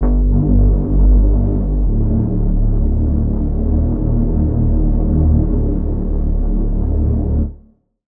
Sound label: Reese; Routed Sound; Spire Expansion - Noble Gangster Vol.1